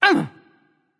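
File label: grunt hit, female; smite; voicepack; hera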